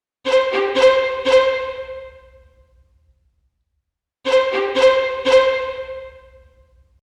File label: Lead